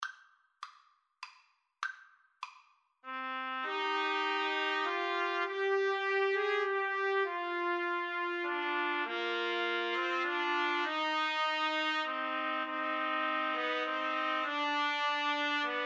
greensleeves, Trumpet Trio

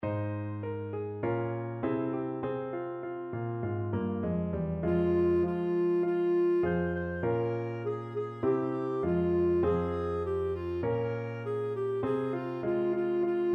dacw nghariad, clarinet

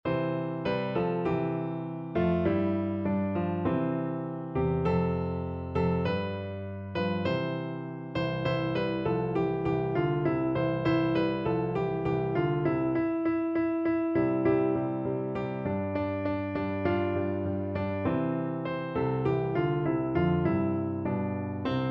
joy to the world, piano duet

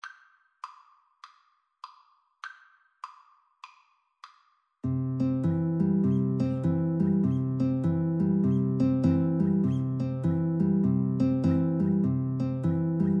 rock island line, Guitar Duet

